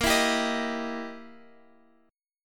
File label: E Minor Major 7th Flat 5th, A sharp